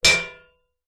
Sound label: medieval sword impact metal shield vibrate